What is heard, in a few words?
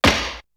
Perc; ZERO G - Datafile DISC 3